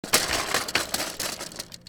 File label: noize